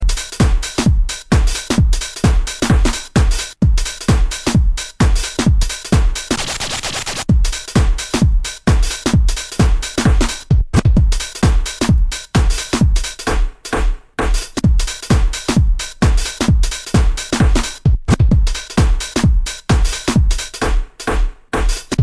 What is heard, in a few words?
Spinal Beats